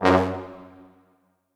F#1 L, Orchestral Brass; Best Service ProSamples vol.20; AKAI

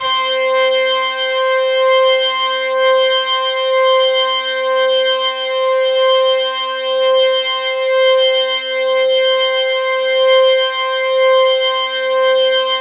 C5, SynthLine; 90 s Sample CDs; NiceBeats; SynthLine VOL-11

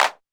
technox clap; Quasimidi Technox

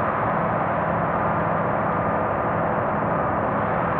sparse soundscape samples; ReLooped

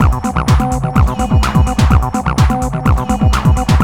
ACID JAM; Best Service Advanced Media Trax Building Blocks

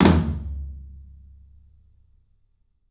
PA TOM, 14 TOM; 3D Sound; 3D Drums; CLUB 14 TOM

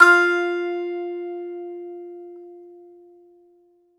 BAGLAMA, Plucked Strings

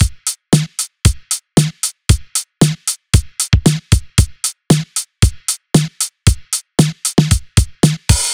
Beat Rocker; Zero-G; Future Wave; Beats

